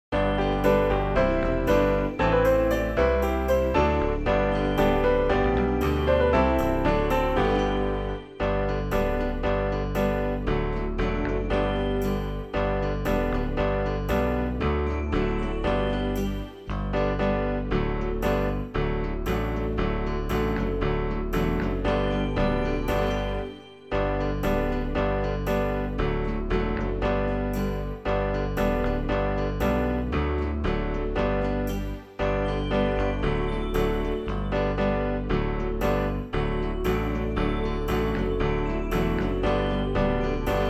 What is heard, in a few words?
backing; New Hope Music